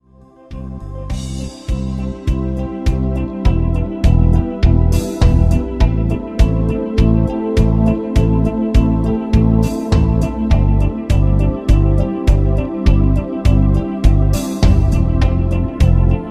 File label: rhythm